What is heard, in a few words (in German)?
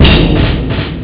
pauke; cadaver; Amiga Soundtracker Sample Packs